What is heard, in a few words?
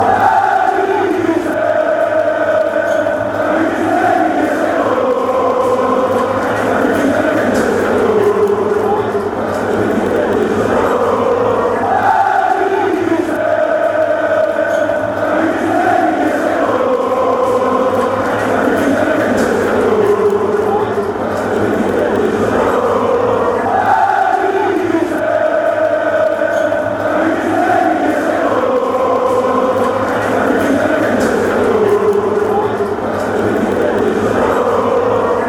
ajax chant